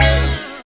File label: STAB